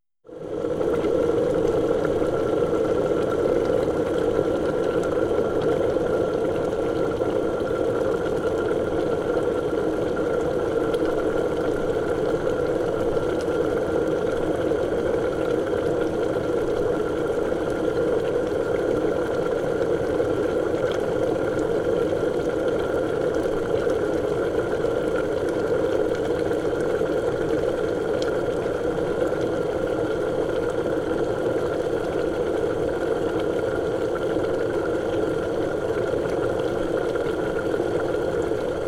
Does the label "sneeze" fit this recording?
No